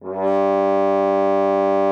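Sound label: French horn G3; Xsample VOL-3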